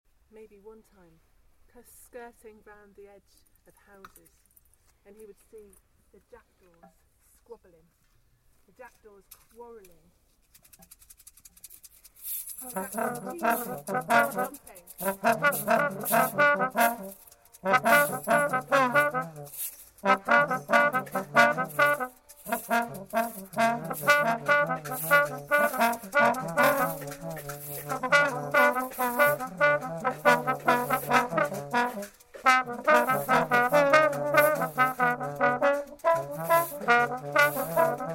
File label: jackdaw music